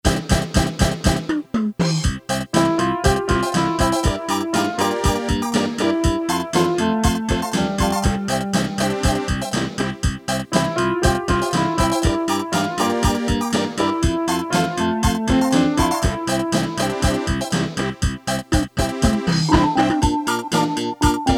Rock, Casio CT-370, Rhythms

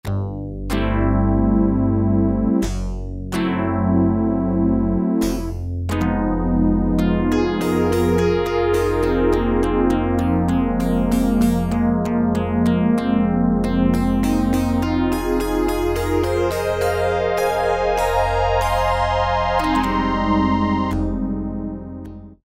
VZ PERC PAD, Casio VZ-10M; Internal factory combi

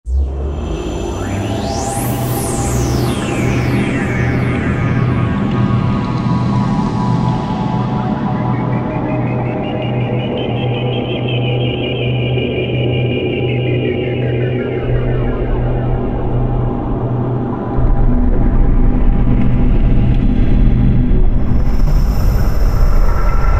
Splits 4 SciFi, Korg TR-Rack

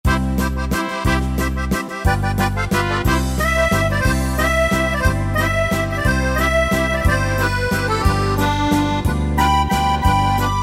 Waltz, Yamaha PSR-3000; Music Finder Styles; Waltz Of Amore

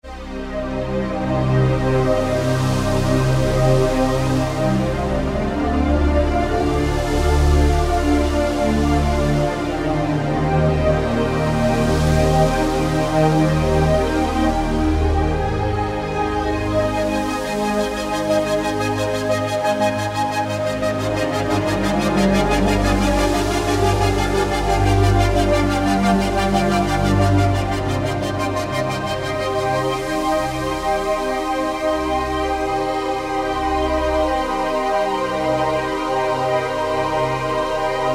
Ocean Mood, Alesis QS8; Factory Preset examples

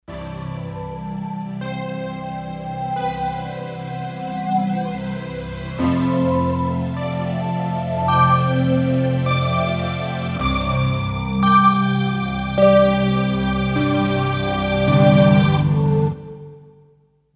Kawai K5000S; Planet

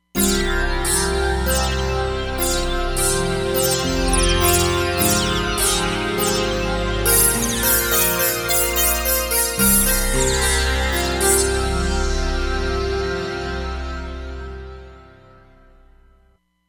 Drone, Roland Fantom XR

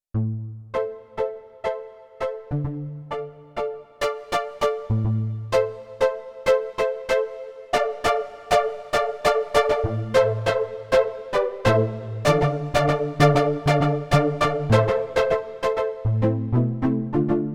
Korg Radias-R

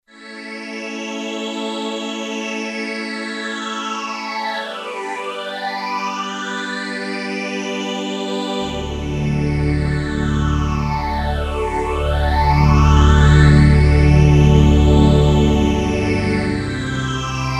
Solina Bi-Phase, Roland JD-990; Vintage Board

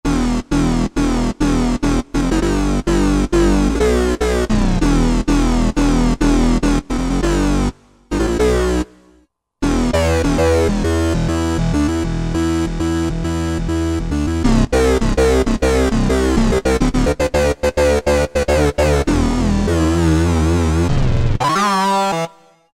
Sync Strings Bass, Roland JD-990; Factory Patches

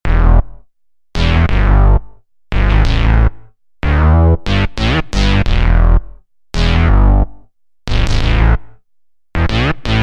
Boot Ce bass, Roland V-Synth; Factory Patches

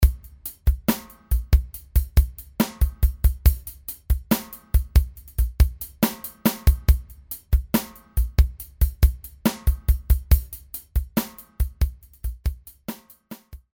Classic Rock Beat; Apple GarageBand 2; Apple Loops

